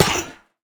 hurt, copper golem weathered; Minecraft

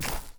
place, dried ghast

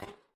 step, copper golem, oxidized; Minecraft

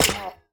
hurt, coppergolem; Minecraft

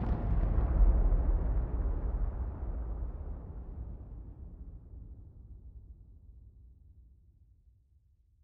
end flash, ambient weather; minecraft 1.21.10

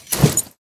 armor unequip, horse; Minecraft

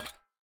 step, copper golem weathered; Minecraft

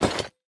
equip copper, armor

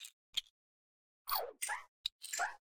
item drop, copper golem; Minecraft

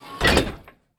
copper chest weathered close

